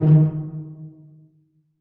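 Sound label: pizzicato D#2 mezzo forte right, string; Sound On Sound - Peter Siedleczek's Orchestra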